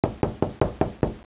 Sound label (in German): klopfen; de stockyard2